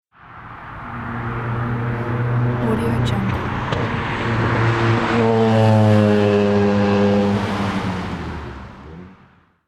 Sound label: race car passing by fast